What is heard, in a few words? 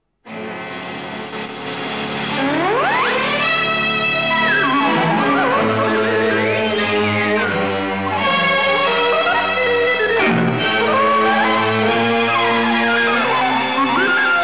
Theme Instrumental; Seeta Aur Geeta